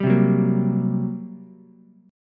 G Minor Major 7th Double Flat 5th, G, Ukulele; strummed; JGuitar

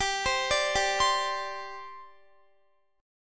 G Suspended 4th, Guitar; Gsus4 arpeggiated; x,22,22,19,19,19

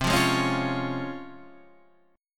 Db Major C, Db/C chord; strummed; JGuitar

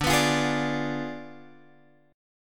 D Diminished 7th D, Guitar, Open F; Ddim7 strummed

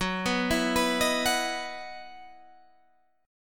E Major 7th Suspended 2nd, Gb

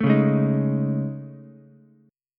A Major Sharp 11th, Ukulele, C6; AM#11; strummed; JGuitar

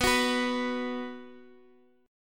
B 5th B down

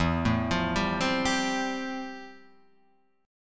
F Major Add 9th E; Fadd9/E arpeggiated; JGuitar

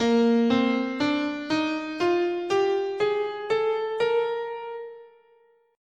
Asharp Bebop Dominant

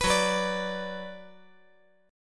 F sharp Suspended 4th Sharp 5th; strummed; JGuitar

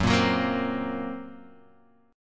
Gb Major Flat 5th Gb, Guitar Drop D; chordshape; down

